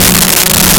Saw Bass; BGM; Nintendo; July 2020 Gigaleak; DrMarioSound

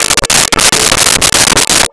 Waw Gtr; BGM; Nintendo; July 2020 Gigaleak; DrMarioSound

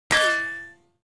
metal, metal hit

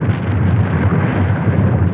volcano; Nintendo; July 2020 Gigaleak

